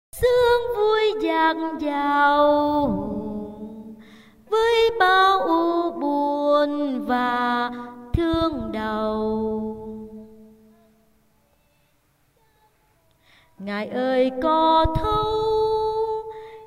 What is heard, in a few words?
basso